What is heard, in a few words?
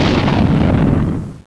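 explosion; barrage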